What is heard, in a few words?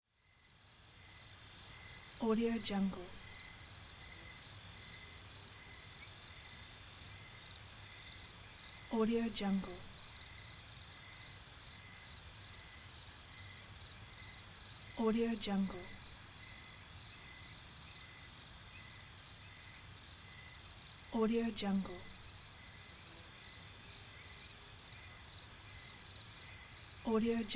nature night